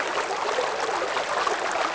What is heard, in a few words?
ambient stream; MX vs ATV Unleashed